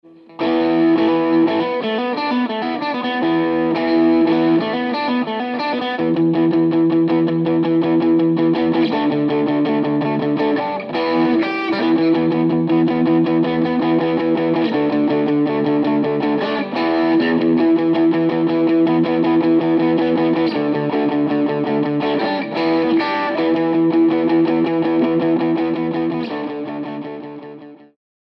git. elektr., gitara

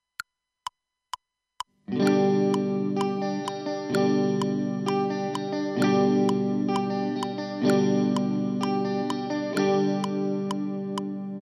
wstęp akord G

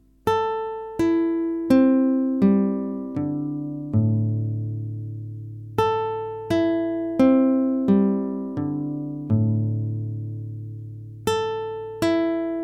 chwytanie poprzeczki 6 strun, gitara

